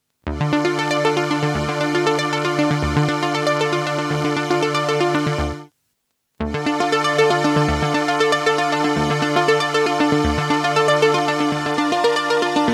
Yamaha SPX90 Panning Synth

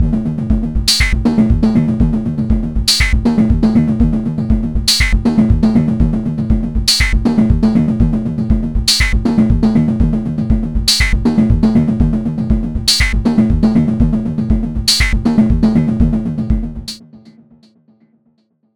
Dreadbox Drips V2 Delay bd

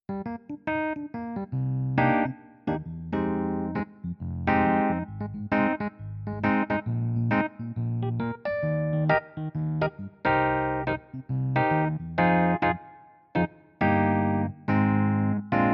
E Piano

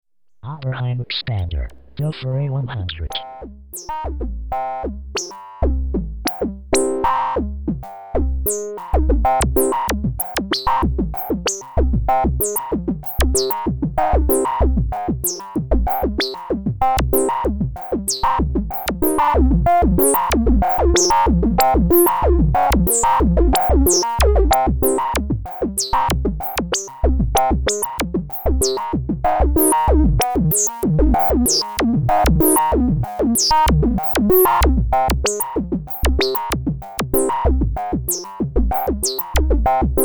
2 Pole Band Pass

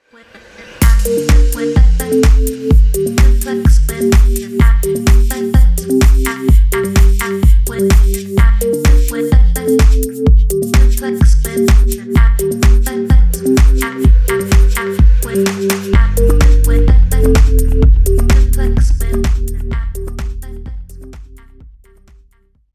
Resonator Reverse Q

